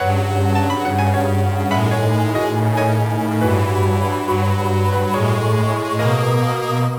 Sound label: BGM STAGE BATTLE; Phantasy Star Zero